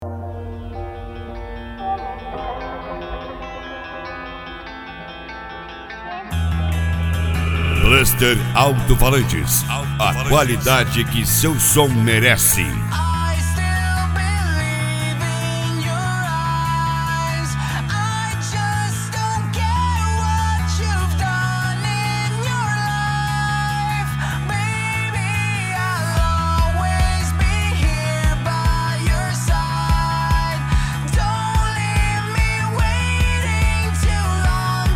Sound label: bass; bluster alto falantes